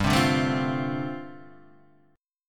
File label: D-Minor 7th G, chord